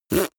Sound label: beatbox effect suck in